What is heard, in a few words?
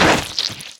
zomb body impact; kfsounds; css